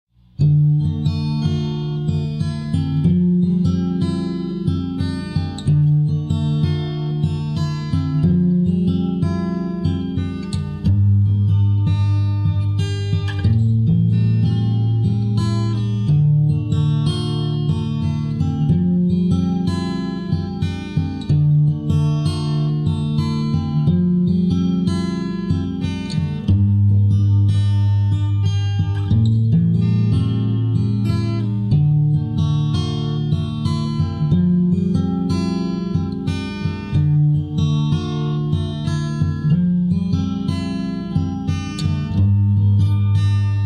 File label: Guitar Instrumentals